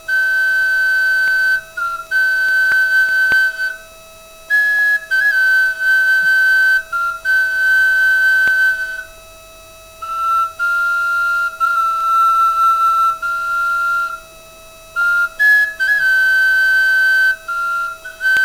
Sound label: new pocket flute